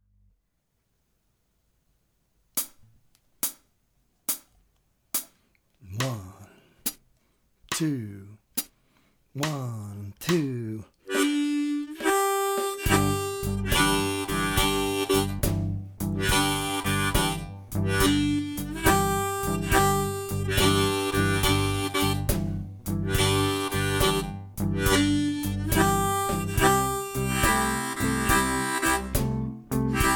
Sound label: Instrumental in G, Acoustic, 70bpm